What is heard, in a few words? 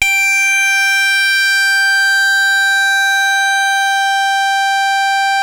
SYNC SOFT G5, LEAD; Music Store - Claudius Diemeter Schwerlos